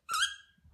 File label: axolotl; Minetest; VoxeLibre; mobs mc